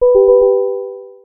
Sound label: DOOR CHIME; WMATA